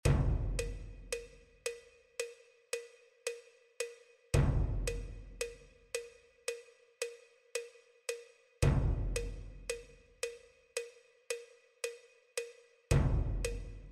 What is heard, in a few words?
perc